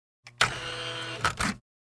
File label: Telefax Scanning